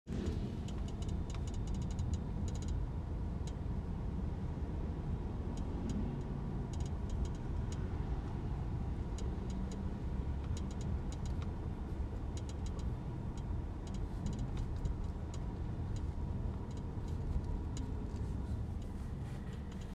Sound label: rattle sound glc250d steering wheel; glc63s glc63 glc43 amg suv coupe x253 c253